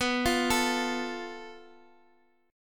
A Suspended 2nd Sharp 5th

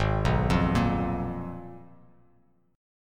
G sharp Minor 6th Add 9th; G#m6add9 arpeggiated; 4,2,3,3; JGuitar

